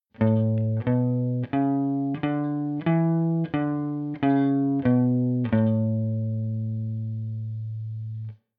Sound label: A Maj Scale Half